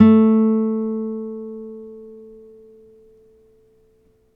NYLON P A2, GUITARS; Synclavier Sampler Library

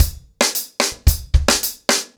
110BPM, ACOUSTIC DRUMS

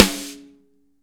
snare dry; Real Mega Drums

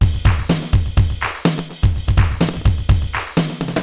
80s delay Akai XE8 (125BPM), cyborg beats

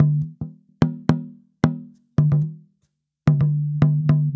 Tambora, African

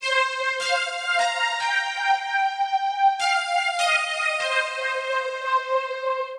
Lead High part, Drum & Bass Keys; Sonic Foundry (Sony Creative Software); Knutrix Drum & Bass

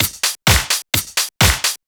Straight Loop 128 BPM, House

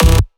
Basses Root C

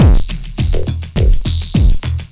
Processed Beat, Drums & Percussion

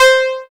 SYN, Microwave; Sound & Vision - Gigapack I CD 2

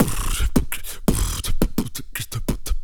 BIG LIPS, Vocal Planet Blues Soul; Spectrasonics

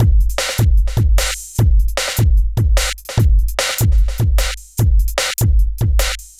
V Drum Loop; Sonic Foundry (Sony Creative Software); Electronic Point Blank; Drums & Percussion

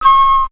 Wind; 90 sSampleCDs; Coldcut's Kleptomania VOL1; Brass n Wind

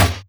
Percussions

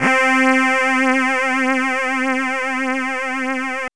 PAD L; AMG - Vince Clarke Lucky Bastard

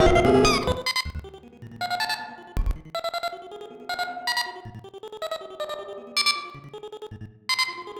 Battered Synth; malfunctioning synth samples

